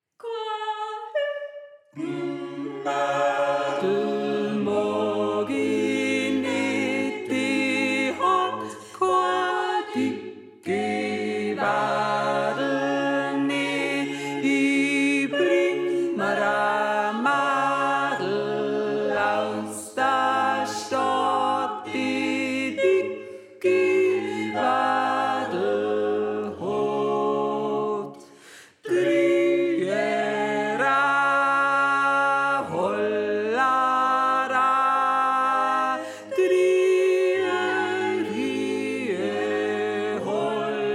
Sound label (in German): Tenor